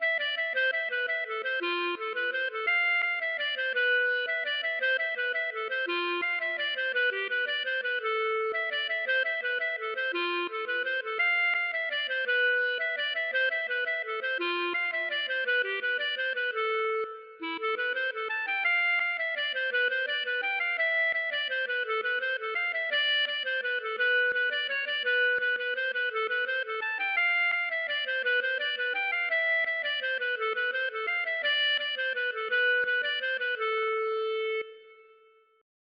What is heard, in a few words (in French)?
valse